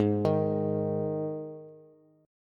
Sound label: Ab 5th Ab Bass; arpeggiated; JGuitar